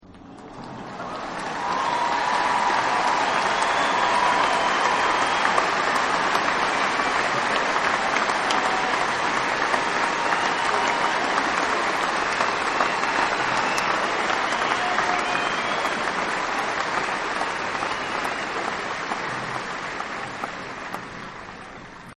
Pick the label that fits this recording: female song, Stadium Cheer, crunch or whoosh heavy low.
Stadium Cheer